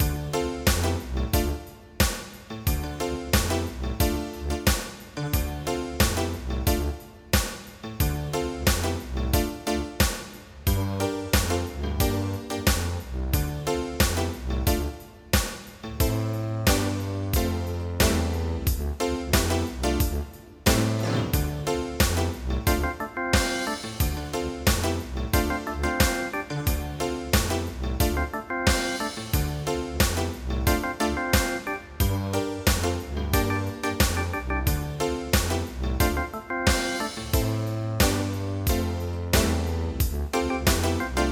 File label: TECHNO